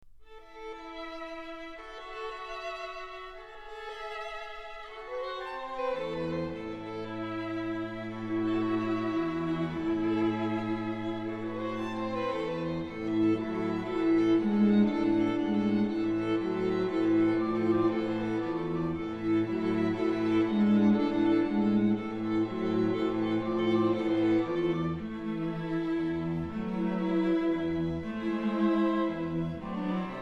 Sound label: String Quartet No. 2 III